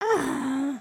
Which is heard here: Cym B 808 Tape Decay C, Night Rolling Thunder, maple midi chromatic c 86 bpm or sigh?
sigh